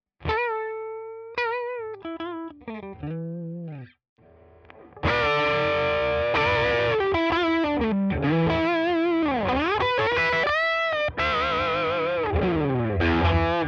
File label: Walrus Fundamental DRIVE Smooth Lead